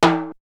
Bongo; ZERO G - Datafile DISC 1

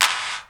GATE CLAPS; Crypton - DrumBase5000; GATE KITS

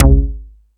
Dance 2000, Drum Machines E-MU Pro-Cussion

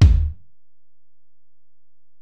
Ac BD, Alesis DM-PRO; 300 Drum Machines

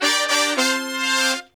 BRAZ LICK, BRASS LICKS

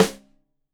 Rim Shot Stereo, drums

